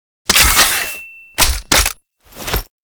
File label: Shotgun Reload; Veilbreaker; Kahl